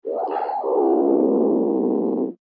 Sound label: Cat Short Growl; Cat Sound Effects; Animals